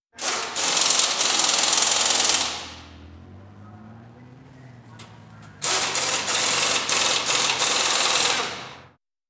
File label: Wheel nuts being power driven in garage; Sounds Visual; The Sounds of New York City